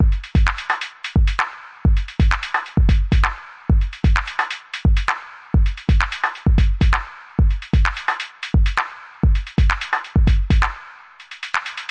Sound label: breach beat intro, drum loops and breaks